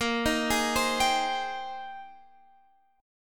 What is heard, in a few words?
Ab Major 7th Bb; Abmaj7/Bb; arpeggiated